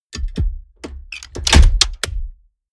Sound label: mechanics large lock